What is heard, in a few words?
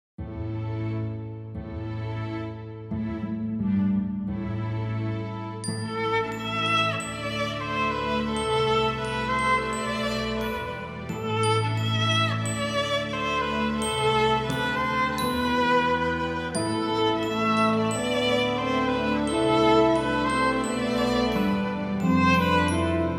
Sonatina Andante; Concert Sonatina